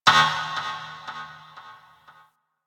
Ship Energy Knock; Warframe; Corpus Ship Remaster

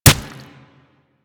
Kunai Impact Flesh; Warframe; Hit Effects

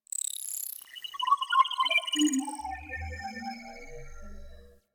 Beep Short D; Ambience; Interior; Warframe; Orokin

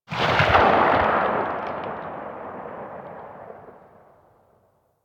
Laser Aim Rifle Tail, Weapons; Grineer

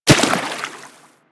Run Water, Footsteps; Warframe; Operator

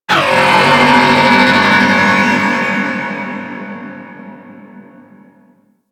Creature Robotic; Warframe; Corpus Outpost; Ambience

